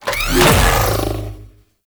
Zanuka Companion Laser Beam Cast; Warframe; Characters; Abilities

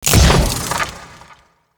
Sentient Death Explosion; Sentients; Sentient Movement

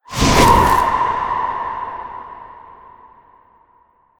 Drain Aura Start; Warframe; Zariman; Corrupted Void Ghost

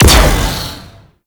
Alt Shot, Weapons; BE Tetra

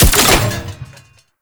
Exalted Sniper Alt Fire Mech; Frumentarius

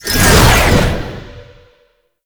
Demonium Cast; Warframe; Uriel

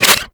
Roulette Projectile Jam; Cowgirl

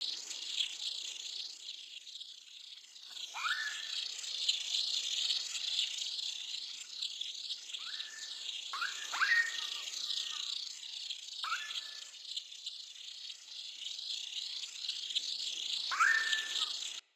Critter Loop, Root Cave Ambience; Neathuns

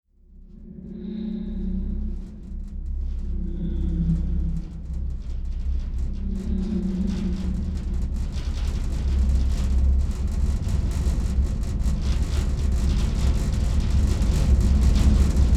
Seance One Rumble Build; Warframe; Harrow

